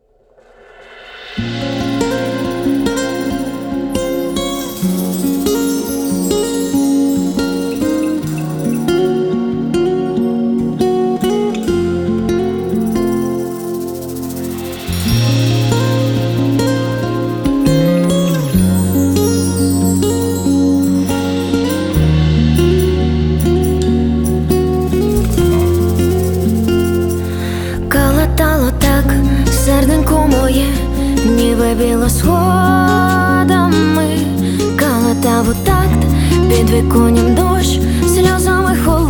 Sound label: acoustic